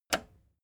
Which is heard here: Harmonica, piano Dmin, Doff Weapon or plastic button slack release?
plastic button slack release